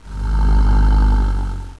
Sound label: mutant